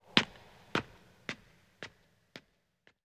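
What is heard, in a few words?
Footsteps Stone Ascending; The 39 Steps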